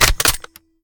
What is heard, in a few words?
charge; tacz; daffas arsenal